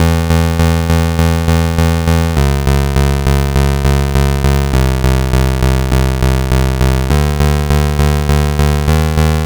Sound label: Game Boy Bass; Nintendo Game Boy